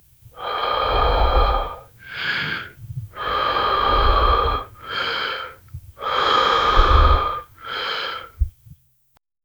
suara nafas seorang laki-laki

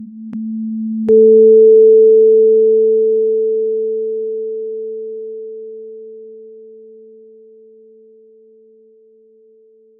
getaran lembut, vibration

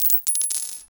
transaksi tumpukan co, coins

